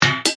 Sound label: Multimedia Percussive, Technology, Multimedia, Percussive, Element; BlastWave